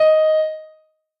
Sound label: noteblock d sharp 2; mesecons